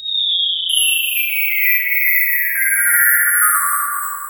chimes, synth chimes; Zero-G Ambient 1

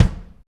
Acoustic Kick

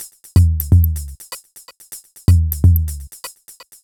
Top C 125bpm, House Drumloops; 90 s Sample CDs; Zero-G House Fabrik Drumloops; ZG Drumloops

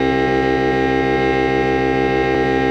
FOGHORN, Anime Sound FX; COMIC

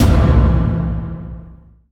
movie, impacts & hits; Techno Trance Essentials

